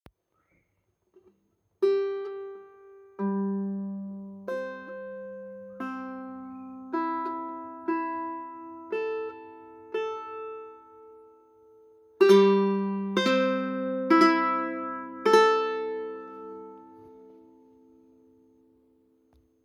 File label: single strings, kala 8-string tenor; T8